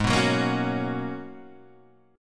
Ab Minor 11th, Ab, guitar chord

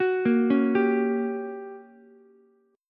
G Minor Major 7th Flat 5th, G, down, Ukulele; arpeggiated; JGuitar

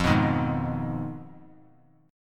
F Minor 7th Flat 5th; strummed; JGuitar

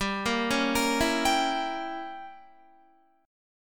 G Diminished 7th, Guitar; Gdim7 arpeggiated; 14,13,13,15,16,15

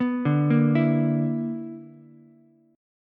B-Minor Major 11th, Ukulele C6; BmM11; arpeggiated; JGuitar

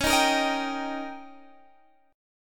D sharp 7th C sharp, guitar

